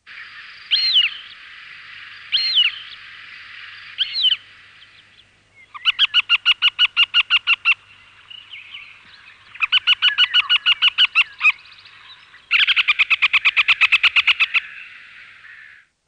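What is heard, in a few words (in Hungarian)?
Barna rétihéja; Madarak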